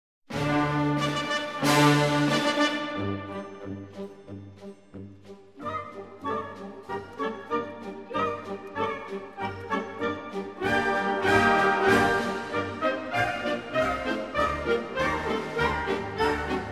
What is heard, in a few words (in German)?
Persischer Marsch